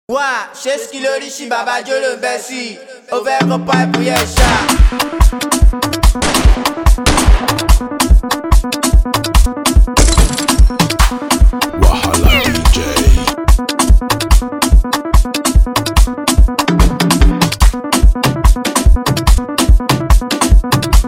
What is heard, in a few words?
Dance Free Beat